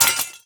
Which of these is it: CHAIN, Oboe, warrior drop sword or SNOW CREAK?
warrior drop sword